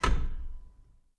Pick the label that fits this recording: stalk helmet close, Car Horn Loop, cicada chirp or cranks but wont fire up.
stalk helmet close